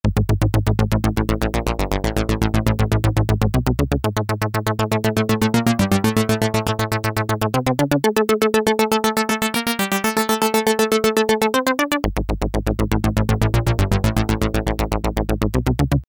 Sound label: synth